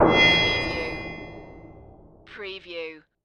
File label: Hit Metal